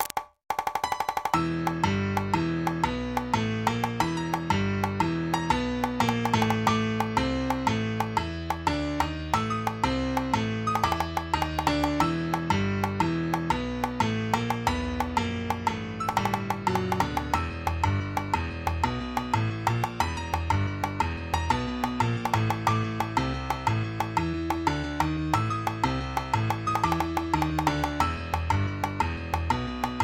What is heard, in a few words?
Intruder alert